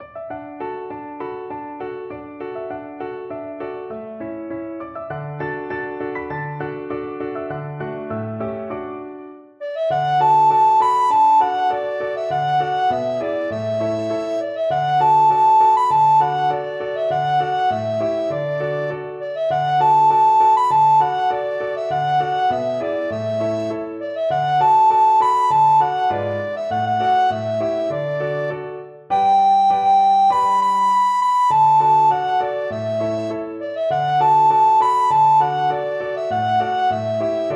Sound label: oh susanna, recorder